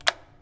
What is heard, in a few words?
Switch